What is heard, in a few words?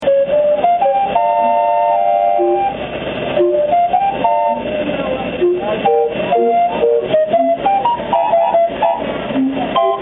calliope; spirit of peoria